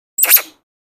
Monkey, Spider Chirps. Two Short High Pitched Chirp